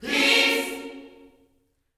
PEACE CHD, Vocal Planet Gospel GOSP CHORD; Spectrasonics

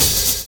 HANDCLAP; Univers Sons

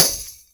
TAMB A; Best Service Advanced Media Trax Modern Composer

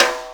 rim hit; Sounds Good - Roots Reggae

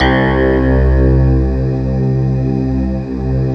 SYN, Roland, SYN DIGITAL; Sound & Vision - Gigapack I CD 2

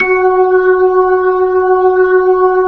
B-3 F#5, Jazz; Keyboards of The 60's and 70's

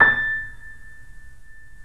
3D Pianos Yamaha C7; E-MU Producer Series Vol. 5

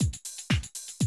120, Classic Drum Loops

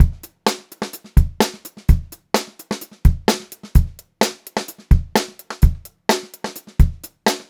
128 funky beat, funk groove drumloops; USB Soundscan vol.38; AKAI

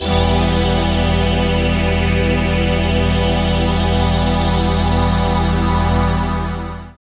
SYN DANCE, Roland; Sound & Vision - Gigapack II

